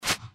wingflap back; npc; cazadore

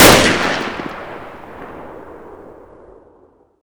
ak47, weapons

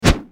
swing knock large

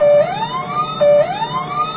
fire alarm, heli; wac

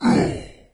melee; doom3; commando

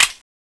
clip out; tfa cso; uzi

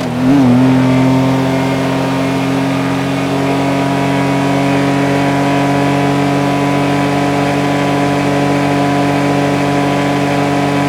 simulated vehicles second

